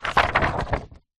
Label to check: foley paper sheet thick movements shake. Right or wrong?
Right